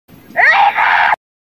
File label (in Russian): krichit